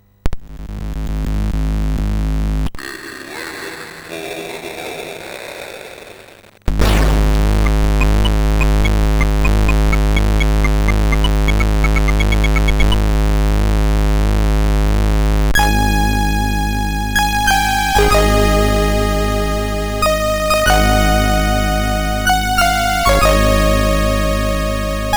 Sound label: MOS 6581, Good speech waveform; working revision 2 6581 chip made in Korea in week 45, 1982